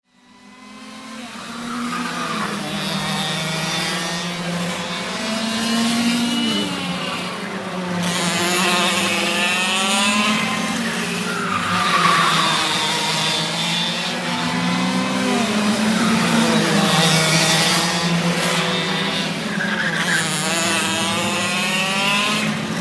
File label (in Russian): zvuki avtogonok